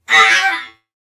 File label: death